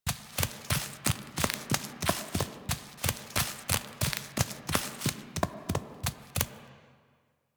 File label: Horse Steps Trot Loop Vegetation; Vehicles; Duviri